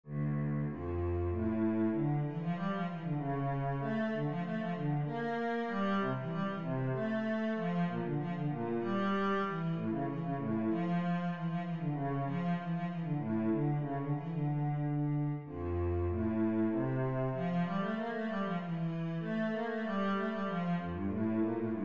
rondeau cello

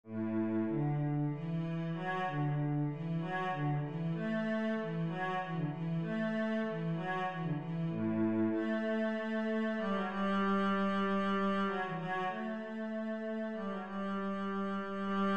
Water Music cello

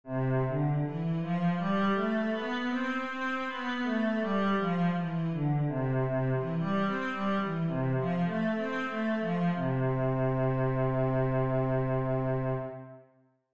cello C major scale

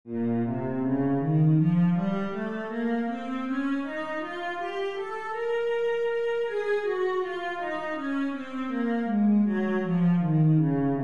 b flat minor, cello

